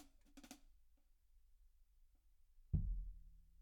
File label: Kit